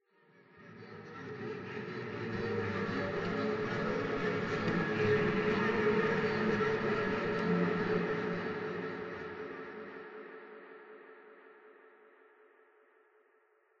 Interior Reverses

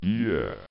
Heartbeat; Tempest 4000

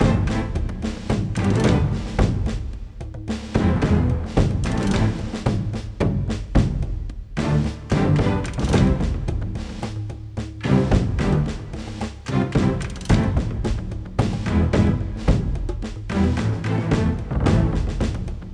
Duel Music Bad

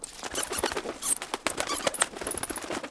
Scrolling Bats; LEGO My Style Kindergarden; macOS; Windows

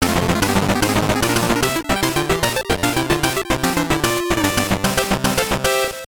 Jingle; tokoro san no setagaya c.c.; gbc

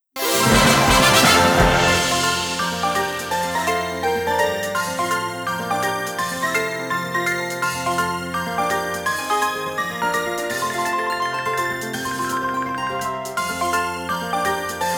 Victory!; Trainer

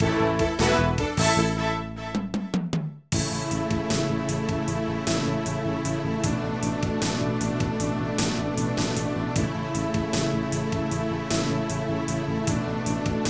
Victory Loop

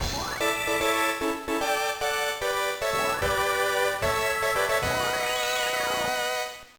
Jingle

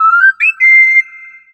Ticket Acquired, Jingle; Battleground Z; 3DS

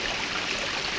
Ambient Rain

